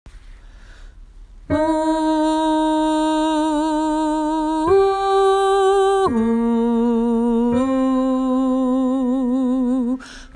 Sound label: o-ing alt; åfjord